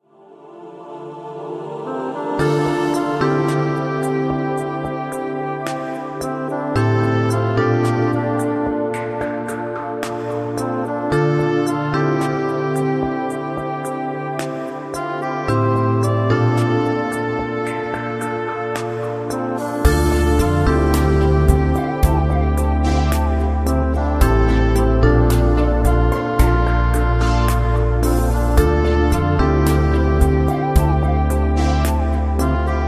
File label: Sound Of Soul